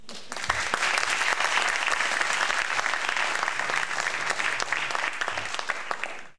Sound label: applaused; monkey2; PoolMod; billiards